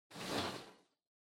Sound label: Loose Fill Packaging Far Rustle; 梱包材; バラ緩衝材; 遠い